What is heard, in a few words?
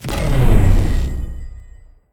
beam level refire; smite; god atlas